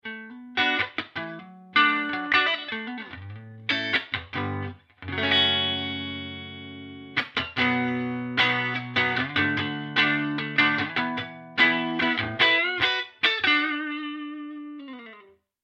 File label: clean